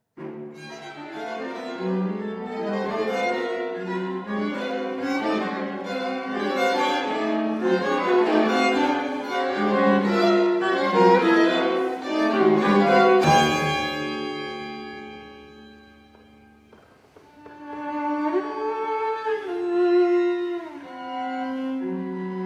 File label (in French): Quatuor à cordes